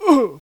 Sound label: Human Old Hit; The Battle for Wesnoth